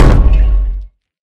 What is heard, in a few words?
Footstep Exosuit; Armor; DynamicEuropa; Footsteps